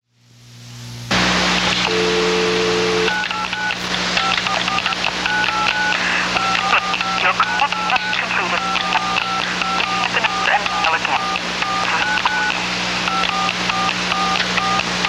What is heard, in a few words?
funky phone regular